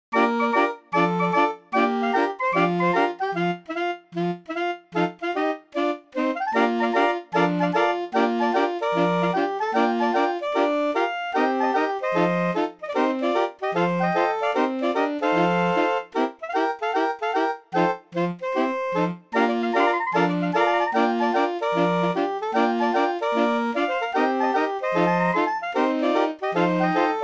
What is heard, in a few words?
draaiorgels